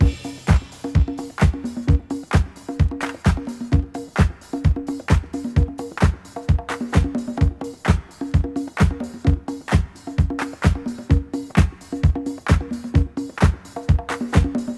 130 bpm modern breakbeat c key

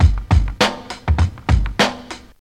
100 bpm drum loop e key

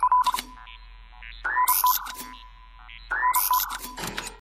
synthesized beep

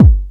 raw kick single hit g sharp key

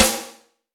airy sizzle steel snare drum g key; snare drum samples

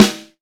hot steel snare drum g sharp key; snare drum one shots

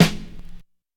thick snare E key; snare drum samples; drum single hits one shots

